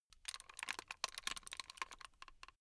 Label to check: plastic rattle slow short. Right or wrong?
Right